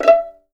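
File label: E5 pizzicato F, violins; East West; The Ultimate String Collection; VLNS PIZZ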